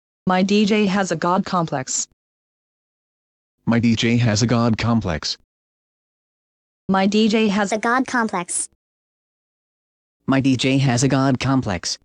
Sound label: 145bpm